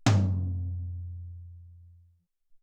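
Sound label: Att Tom Low, Alesis SR-18 Drum Machines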